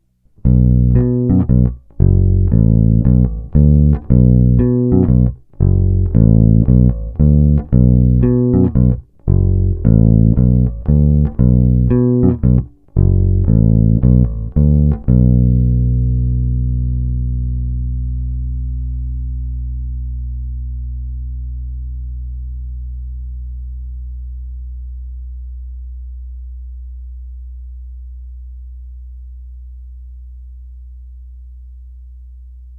Precision Bass, Hra nad snímačem; Fender Custom Shop Pino Palladino Signature Precision Bass

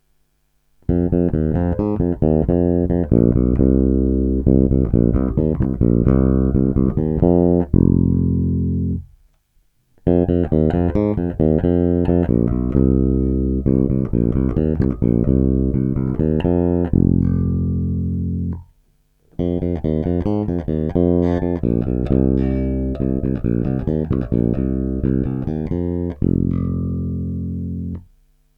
Snímač u kobylky aktivně, Baskytara; Aleš Vychodil AVBS5

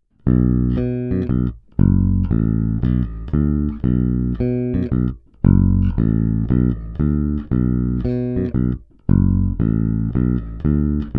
Cívka 1 – kobylkový snímač; podobný charakter jako má kobylkový snímač Fender Jazz Bass; Ernie Ball Music Man BIG-AL

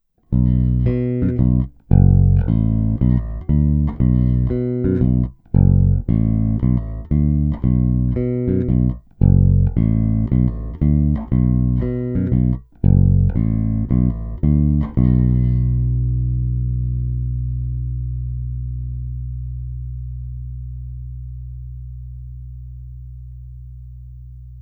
Tónová clona naplno, baskytara; Harley Benton MV-4MSB Gotoh BM

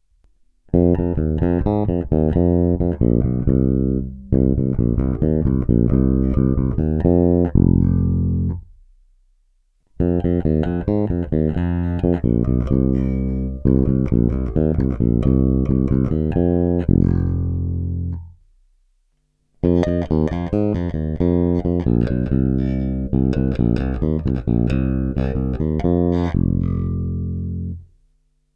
Snímač u krku pasivně, Baskytara; Aleš Vychodil AVBS5

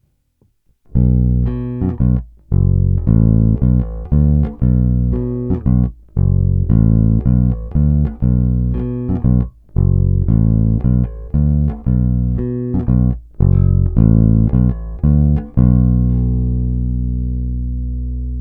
Hráno nad snímačem FW, Lakland 44-64, Baskytara; Bob Glaub

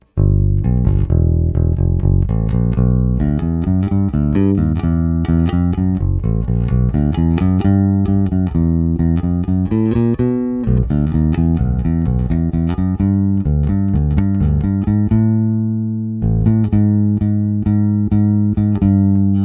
Techwood hmatník - tónová clona otevřená - původní krk s techwood hmatníkem, Precision bass, Struny La Bella Deep Talkin Bass flatwounds; Téma: Jay Turser Precision bass JTB-400C-BK-A-U

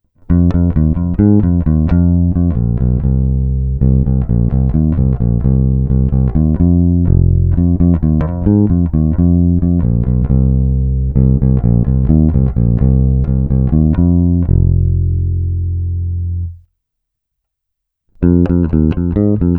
Ukázka 1 (FW), Mike Lull V4, baskytara; snímač u krku, oba snímače, snímač u kobylky

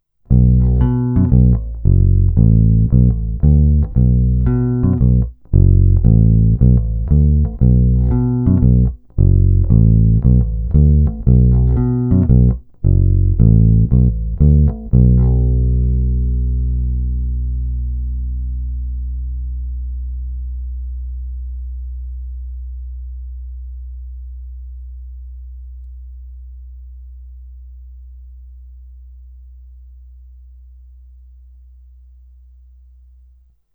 Hnědá – kobylkový snímač; Xotic XJ-1T; Černá – krkový snímač

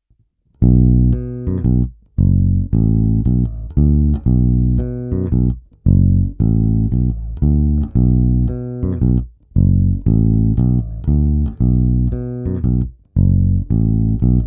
Paralelní zapojení, Music Man Sterling baskytara; Ernie Ball Music Man Sterling

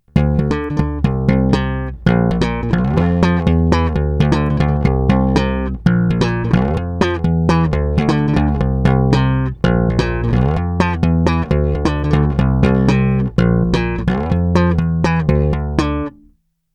Ukázka 2, Baskytara

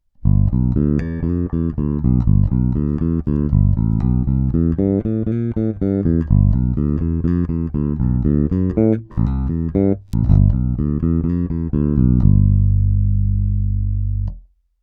Baskytara Ibanez SRX-700, pražcová, 4 struny, prsty, D'Addario EXL-165, Nickel plated steel, roundwound; v konfiguraci snímačů H-H

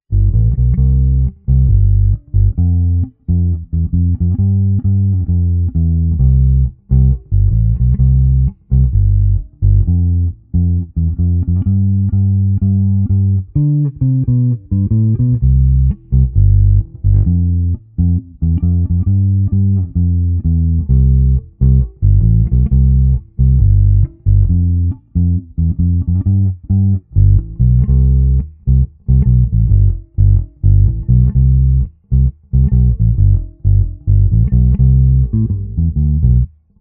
Precision Bass, Ukázka přes Darkglass Alpha Omega Ultra se zapnutou simulací aparátu; kompresor TC Electr; Fender Custom Shop Pino Palladino Signature Precision Bass

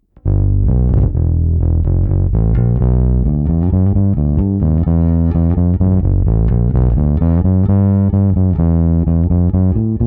Jay Turser Precision bass, o dalších 6db více gainu; JTB-400C-BK-A-U; Trochu víc gainu; stažená tónová clona + houbička na nádobí pod strunami u kobylky

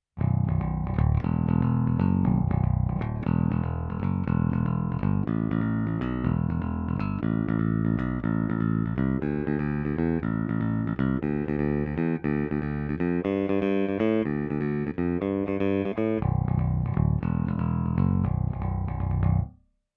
S-S trsátko, poniklovaná ocel, Roundwoundy, Schecter SLS Elite-5 baskytara; D'Addario NYXL 45 - 130; aktivní oba snímače, v jednocívkovém zapojení; zvukový mód classic; hra trsátkem D-Grip 1,14 mm

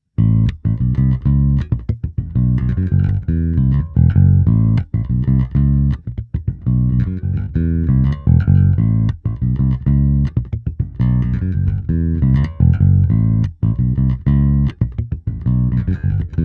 Nahrávka se simulací aparátu prostřednictvím multiefektu Zoom MS-60B, baskytara; Harley Benton MV-4MSB Gotoh BM

